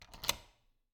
Cabin, front door, deadbolt, lock, latch, thick, close, wide; Eiravaein Works; Vaeyan IV